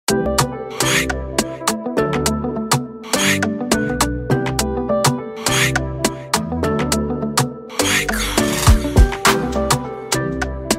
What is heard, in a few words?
Afro Beat Instrumental